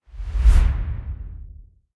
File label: Obstacle Snowball; In Obstacle Snowball